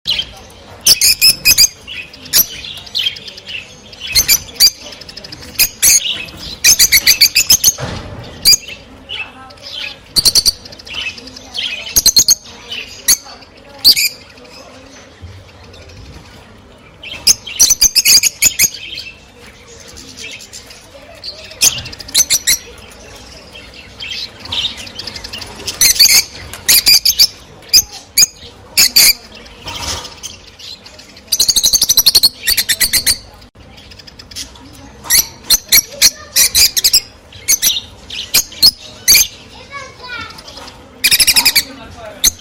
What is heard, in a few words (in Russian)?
krasivoe i veseloe penie i chirikane rozovoshhekih nerazluchnikov; agapornis roseicollis